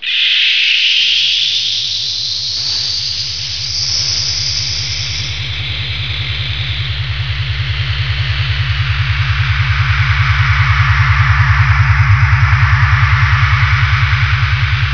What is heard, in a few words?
hand beam